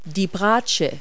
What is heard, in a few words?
viola; Multi-Lingual Talking Picture Dictionary